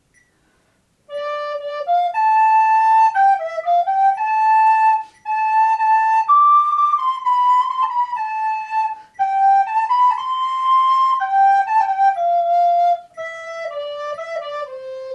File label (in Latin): personent, FLUTE